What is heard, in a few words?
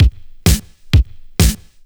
Straight Loop 128 BPM, House